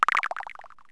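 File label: small splash; Wired for Sound Pro CD; Aristosoft, Inc.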